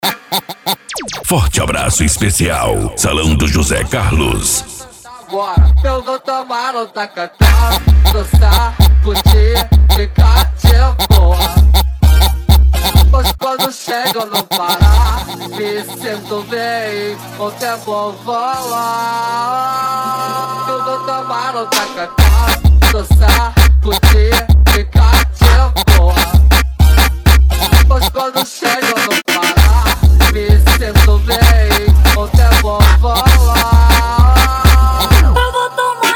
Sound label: funk mega bass